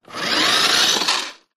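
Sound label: leisure radio controlled car off push carpet short fast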